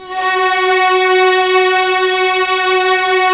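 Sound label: violins; String Master Series; Vlns 6 mf-f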